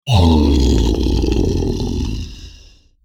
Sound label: die, troll